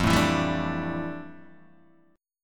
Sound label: F Major 13th Guitar Open D